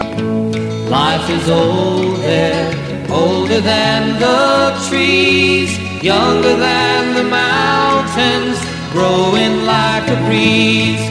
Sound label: country